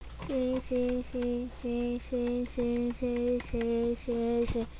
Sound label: sea